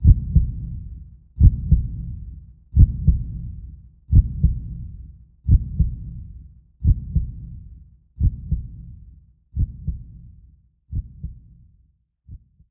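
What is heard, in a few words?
Ambience Athletics Crowd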